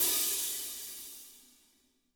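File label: HAT, drums; Spectrasonics; Burning Grooves CD2; DRY KIT 4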